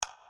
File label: weird, sfx